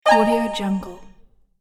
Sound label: spell of the magician